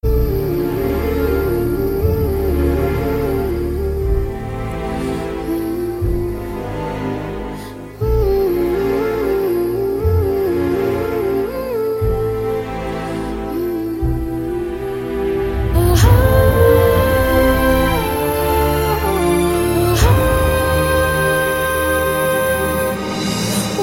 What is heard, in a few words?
Sad Bgm; Sreya